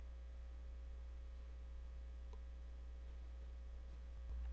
breath

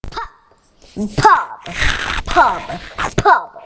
pub

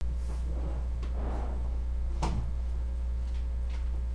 giggle

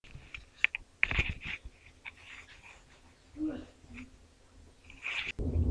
quail

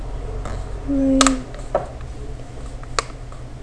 rain

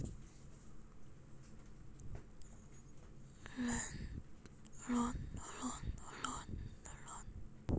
laugh

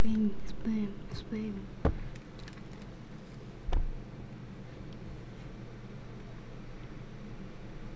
scream

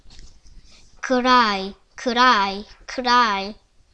cry

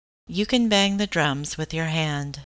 drum

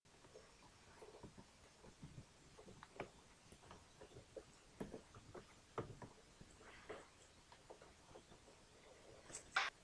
swarm

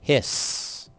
hiss

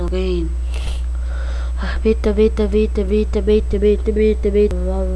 beat

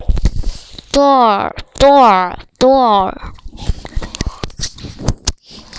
door

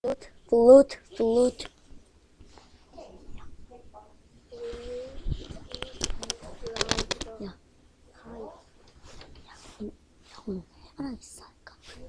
flute

